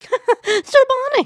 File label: excited, emotes